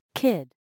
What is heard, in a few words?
kid